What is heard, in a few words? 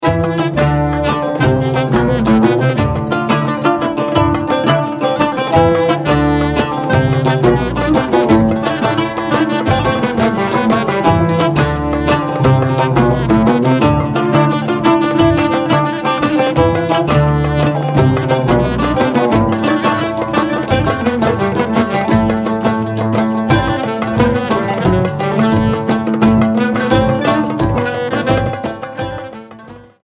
Oriental Cocktail